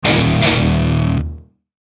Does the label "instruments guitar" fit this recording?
Yes